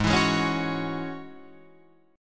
Ab 7th Suspended 4th Sharp 5th Ab down Guitar Open F; Ab7sus4#5 strummed